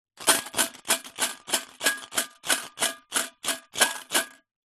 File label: foley money collection tin coins inside shake; zapsplat